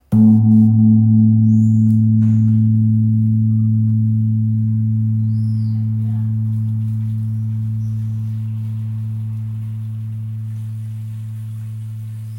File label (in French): Gong birman La 8.4kg